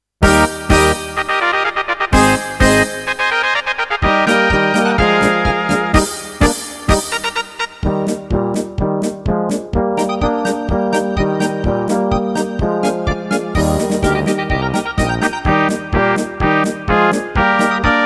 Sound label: Polka